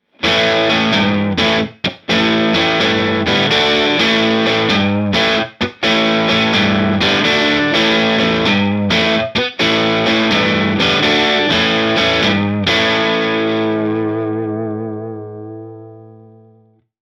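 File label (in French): bogner gretsch, combo guitare; hp haut; bogner alchemist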